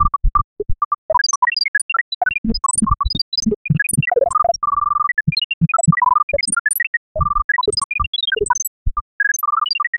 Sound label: flow like glitchy coding